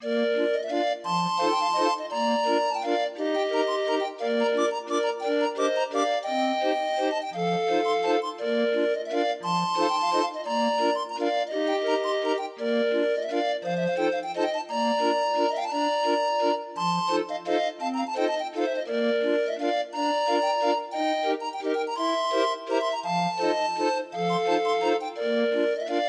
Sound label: Grind Organ